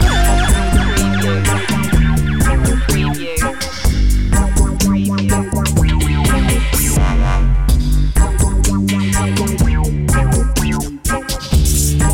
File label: dub weed loop